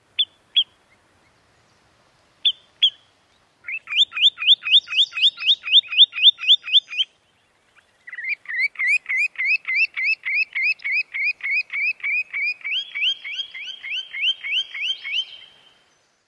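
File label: spotted sandpiper various calls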